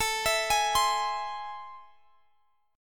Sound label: A Minor Major 7th